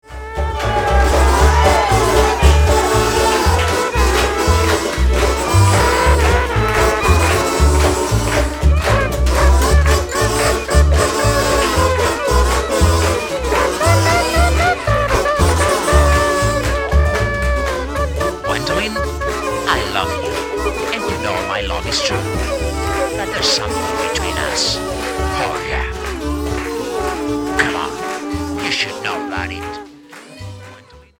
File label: kazoo song